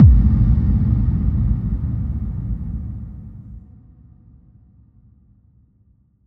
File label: Impact FX